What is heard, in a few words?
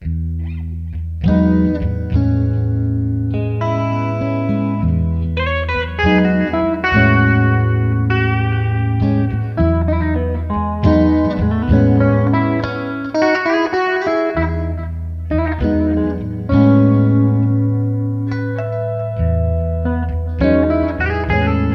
Jazz Romance